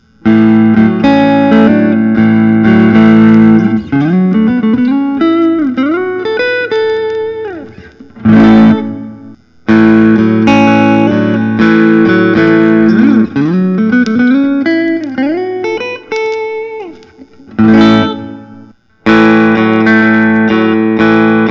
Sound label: lentz hsl two tone sunburst